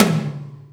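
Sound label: Hi Cannon HL, Alesis D4 drum machine tom; 300 Drum Machines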